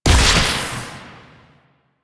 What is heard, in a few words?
Damage Zone Place; Warframe; Nineteeen Ninety Nine; PvPvE